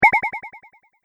Multimedia Bleep With Delay

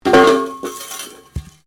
METAL PIPE FALL; Materials

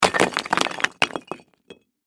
Crash Rocks Drop Debris; Sound Effects:Materials; Blastwave

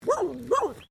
Animal Dog Blue Healer Bark; Various domestic dogs; Animals

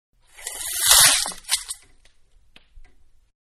Venetian Blinds-Up-Fast; Windows (Sound Effects:Household); Manhattan Production Music

